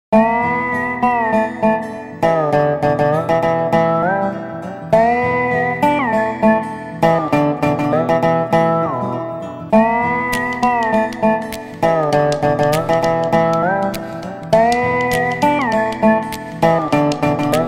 Instrumental Ringtone

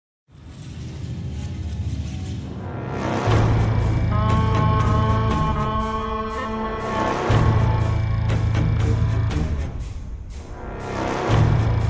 Villain Theme

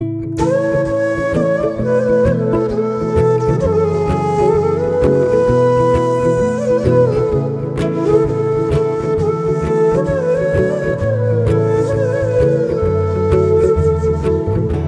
Flute Ringtone

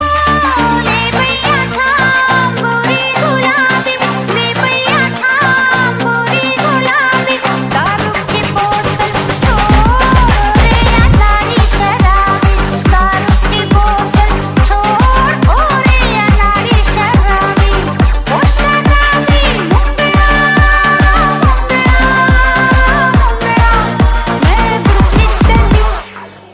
Music Tone; Total Dhamaal

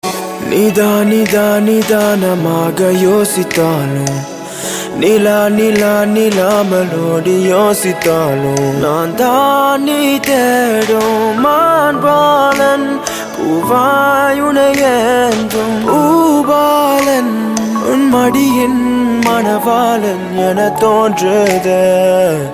Male

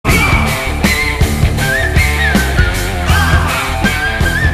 BGM Whistle Tone

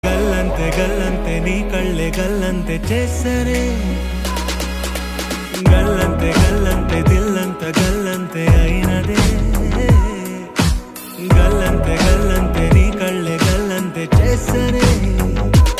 Ringtone

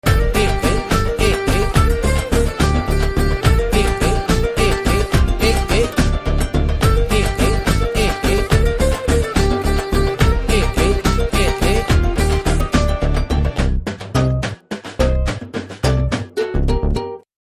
Flute BGM Ringtone

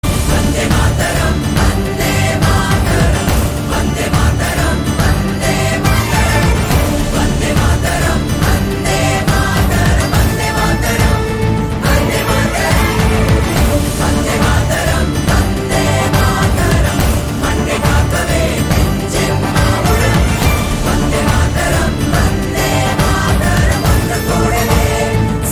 Vande Mataram Karaoke Ringtone

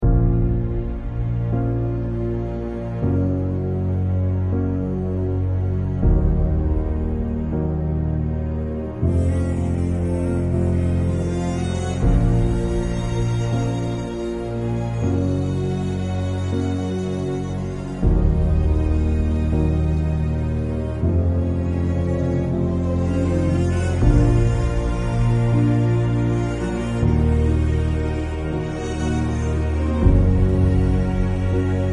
Karaoke Ringtone

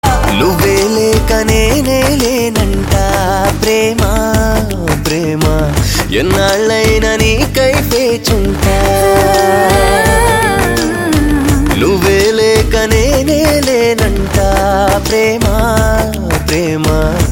Male Ringtone

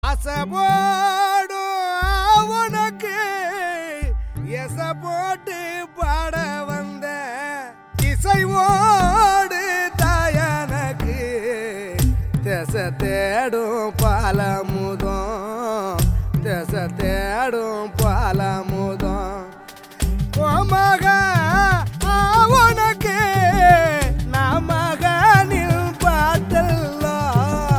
Ringtone

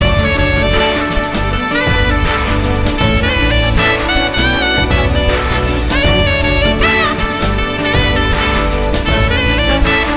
Instrumental Music

